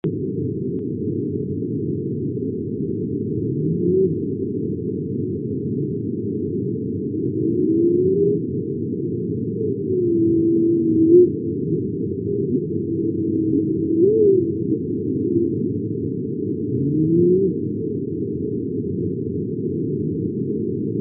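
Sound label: humpback, seasounds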